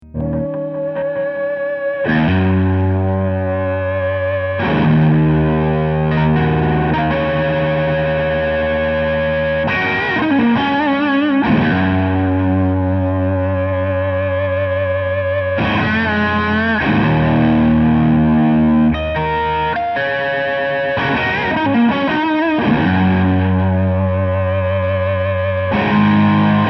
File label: mid boost